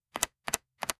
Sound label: paper